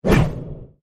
hero leave

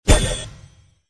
star fall

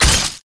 sound attack hit; hitsound

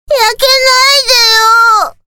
die

